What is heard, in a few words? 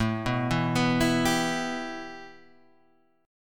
A 7th Suspended 2nd; 17,14,14,16,16,14,x,x